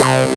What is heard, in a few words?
Synths Root C